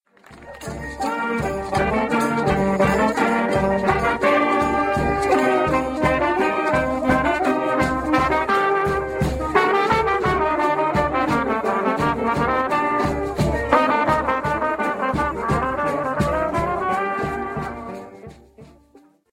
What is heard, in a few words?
jingle